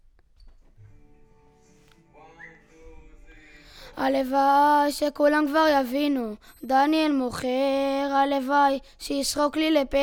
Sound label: VOC